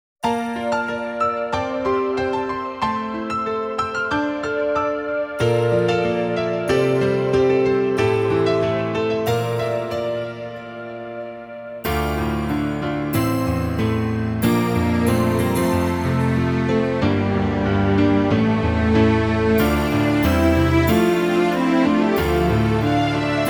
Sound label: off vocal version